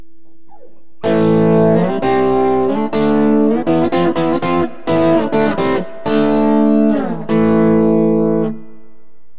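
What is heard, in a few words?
sdcs gold bridge, guitar pickup; burny; fugly clips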